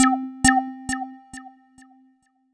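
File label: C2, Roland JV 2080, analog sequence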